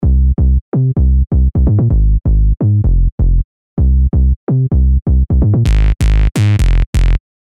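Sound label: bass, Master Sub, Sylenth1; alonso 19 year anniversary pack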